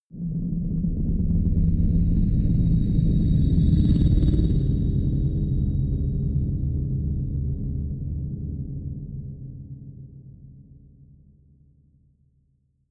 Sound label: dark ring rumble, Risers; whooshes rises